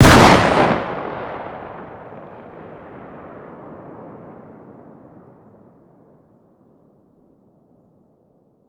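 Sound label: fire distant 12ga exterior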